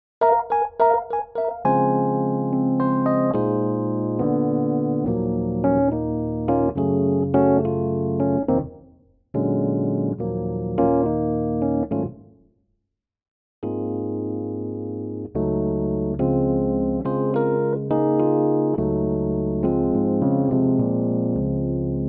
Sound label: Rhodes Db